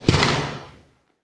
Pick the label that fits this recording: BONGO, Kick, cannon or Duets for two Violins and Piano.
cannon